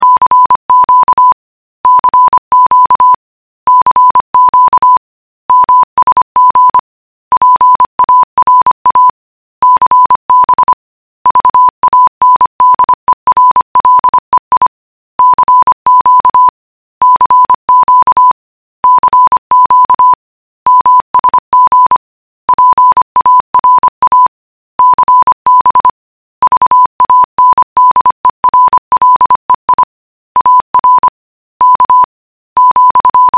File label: morse